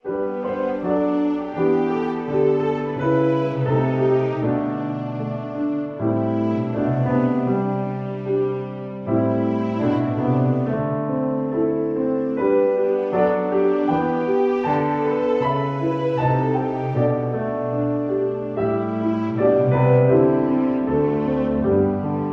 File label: With Strings